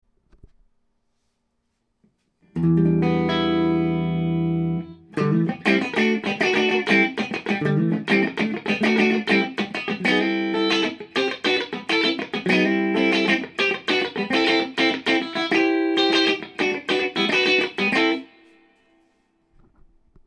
Gibson Les Paul clean 490R + Burstbucker 2 neck + bridge